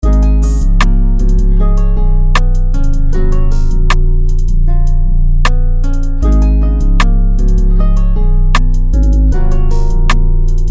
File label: mandolin